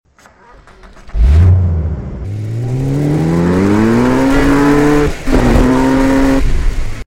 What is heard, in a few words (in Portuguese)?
partida carro